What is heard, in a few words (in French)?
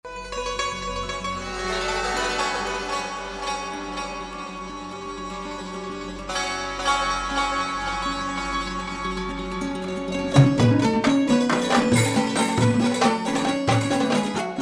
musique